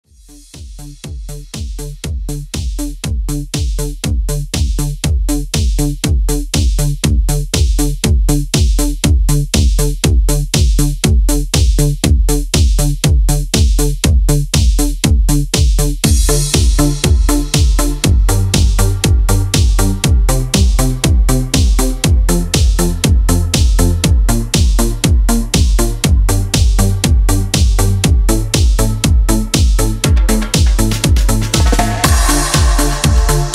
eurodance italo disco

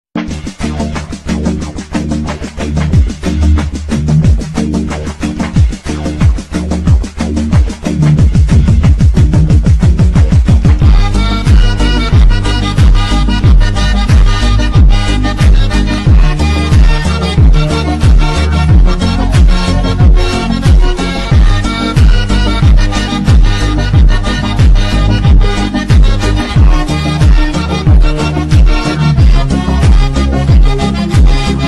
slowed bass

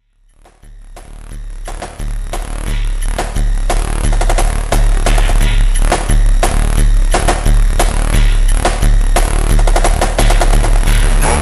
super slowed reverb

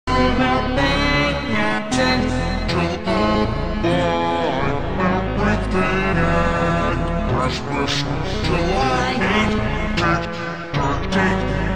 christmas acid jazz super slowed

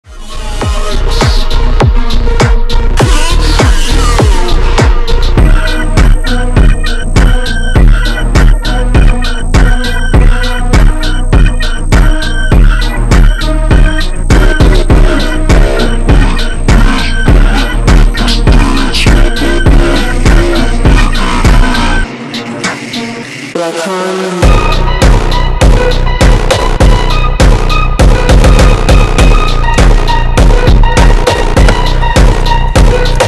slowed

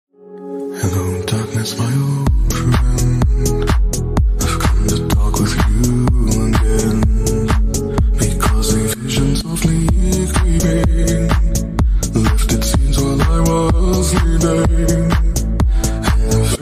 ringtone remix

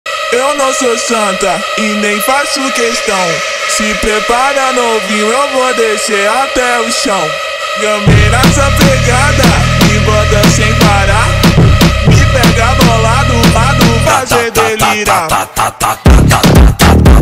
phonk

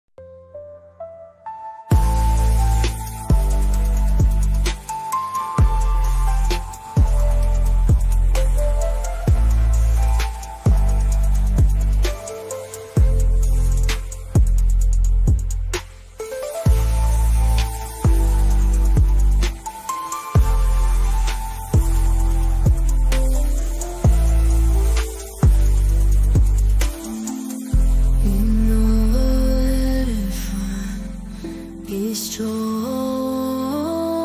electro pop Christian music

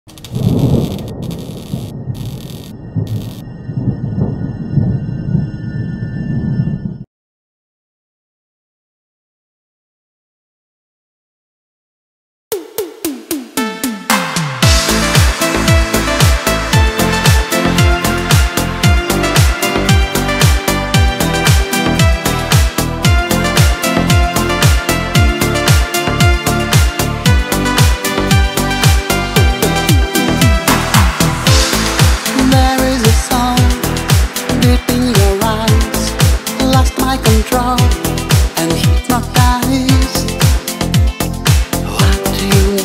italo disco euro disco euro pop; new italo disco

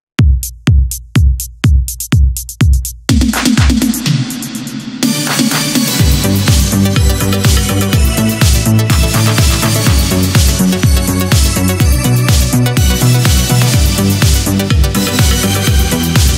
eurodance, italo disco dub mix

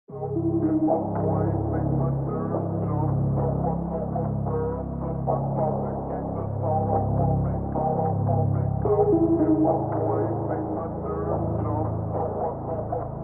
slowed

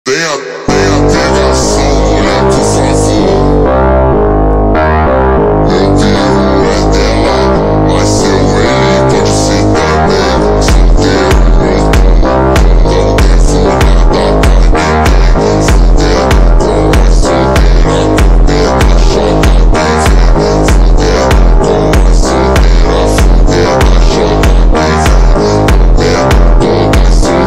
slowed reverb

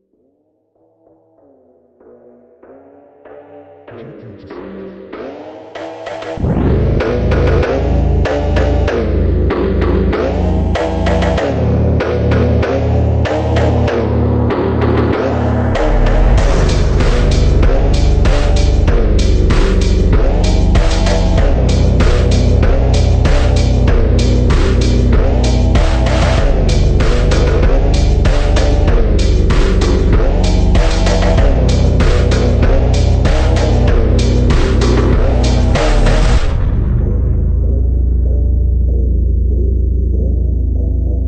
super slowed an reverb an remix song

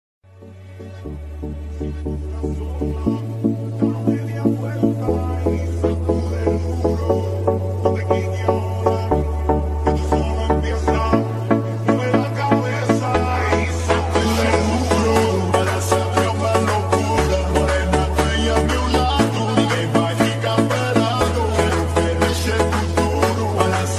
remix long version car music

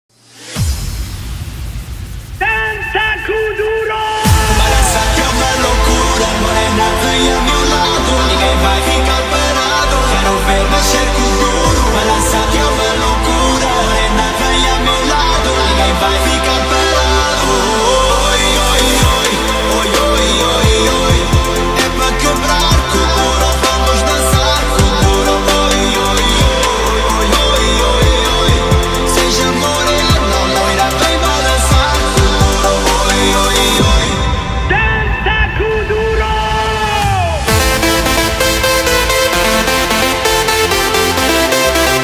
festival mix bigroom happycore

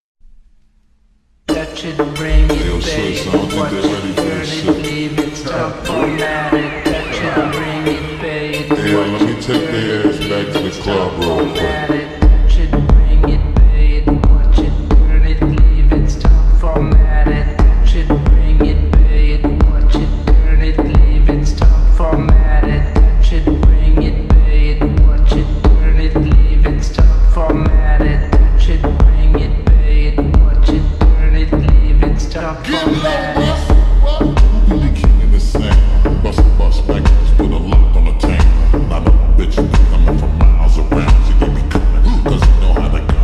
deep remix slowed reverb

